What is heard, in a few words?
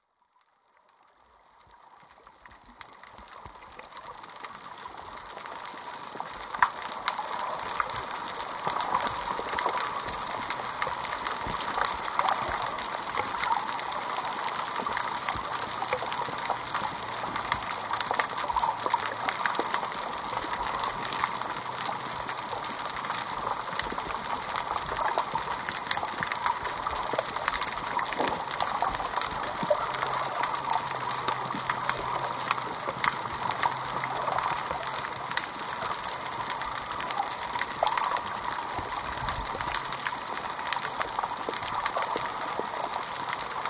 Coastal Ocean Ambience; smallsounds